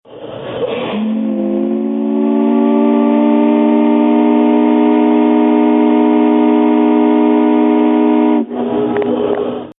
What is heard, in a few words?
whistle, steamboats; minne ha ha